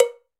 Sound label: Cowbell, Latin Percussion; Drum Hits; Ableton; Factory Packs